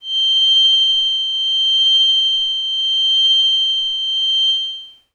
Glass Howl Kawaii C7; pads

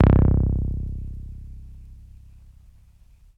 TB303 Saw Vinyl Synths C0; vinyl keys

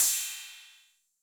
Cym A 808 Sat Decay A, Cymbal, Multi Saturated

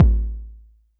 BD

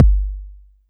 BD; TRX

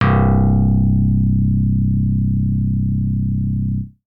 BASS Bass

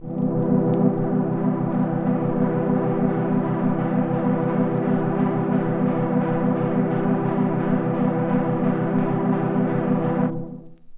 MicroFreak Floating Vinyl Synths Cmaj7 C2; vinyl chords

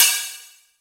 Hihat

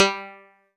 Old Banjo DX100 G3

